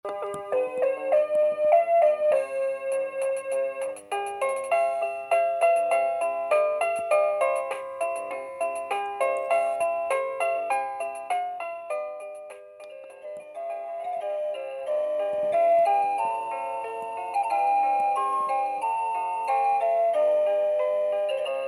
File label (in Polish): pokaz dzwieku alarmu